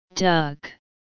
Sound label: duck, farm animals